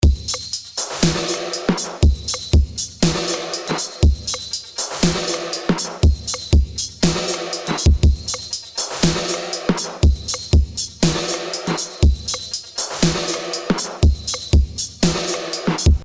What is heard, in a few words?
wider